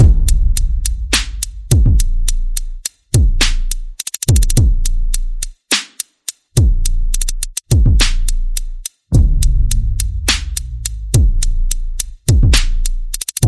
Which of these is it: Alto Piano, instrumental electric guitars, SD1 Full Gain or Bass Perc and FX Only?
Bass Perc and FX Only